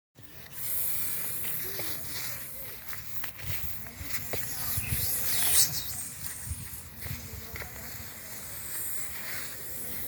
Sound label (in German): Schlangenmusik